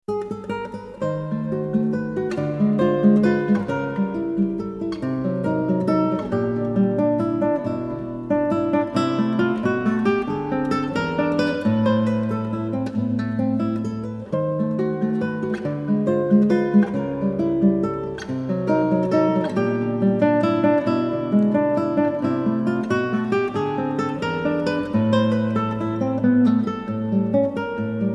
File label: waltz